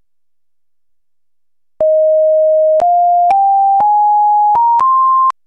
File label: Hail Purdue Twice As High